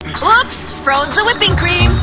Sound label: DROWN; sailorvenus